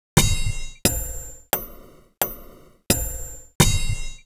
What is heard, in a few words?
Cembalo Excitations, harpsy